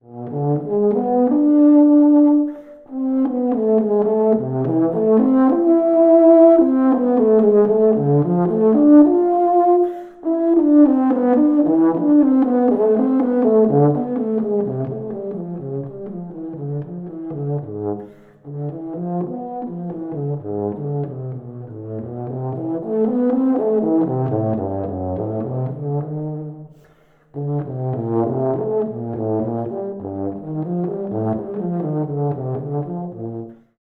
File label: Euph Solo